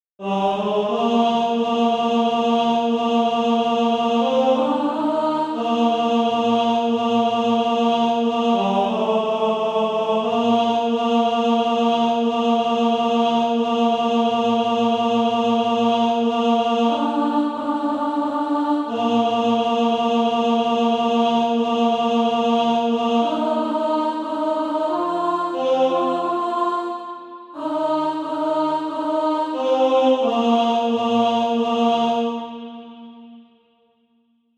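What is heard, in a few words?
tenor, Practise Music; SATB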